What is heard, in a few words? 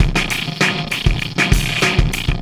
System Overload Beat, Processed Drumkits